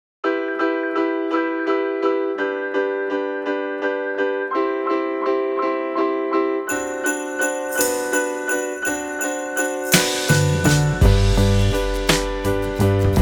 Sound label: INSTRUMENTAL